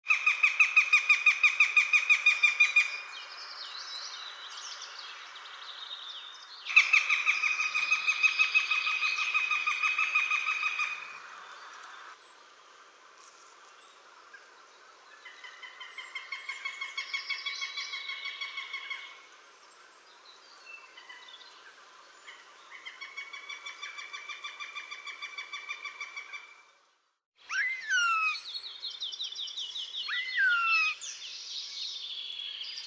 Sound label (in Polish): jastrzeb